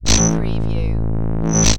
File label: Laser Sword Hum; Sound Effects Bible